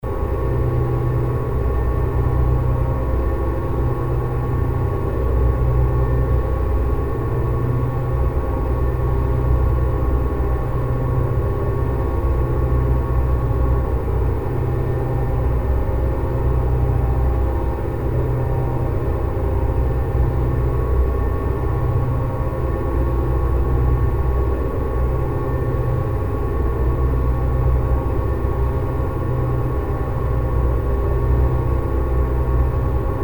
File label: sub pulse transformer